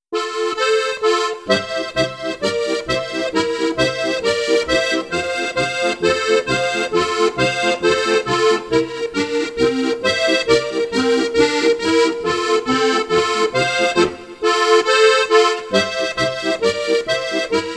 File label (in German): polka